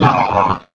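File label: droid hit; Star Wars Knights Of The Old Republic I; XBOX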